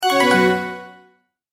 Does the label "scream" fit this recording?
No